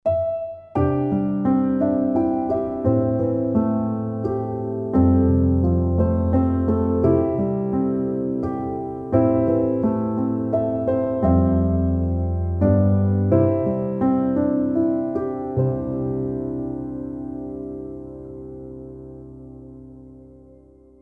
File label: Amazing Grace Soft Piano